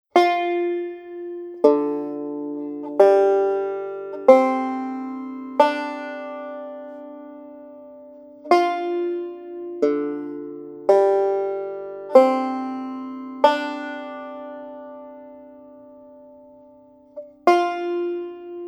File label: F Or Cumberland Gap Tuning fD